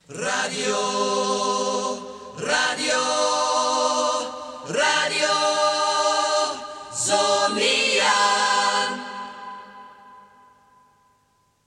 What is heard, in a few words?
A Capella drum; jingles; Radio Zoniën; 1982-83; radiodoender